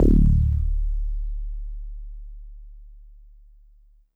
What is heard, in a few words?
Mono Bass DX100 D2